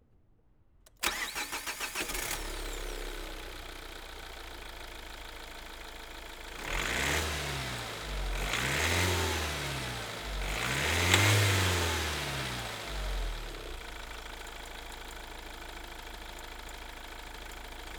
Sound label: mazda demio